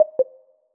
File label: Calibration tone fail; Apple iOS 18.1; TVLatency; Tones